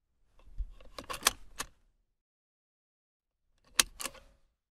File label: seatbelt on